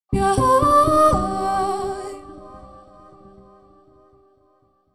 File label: spiritual